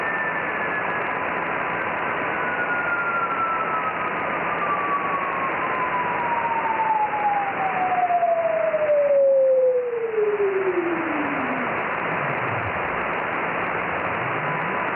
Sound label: iss echo